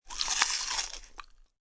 Eating Crunchy, Eating crunchy food, one bite, chips, short; (human voice); Voice Box